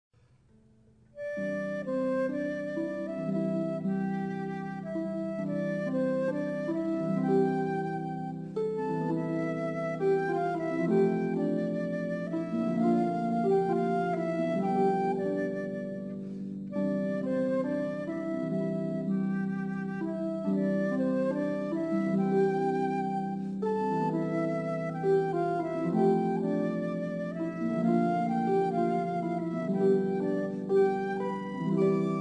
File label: Harp Flute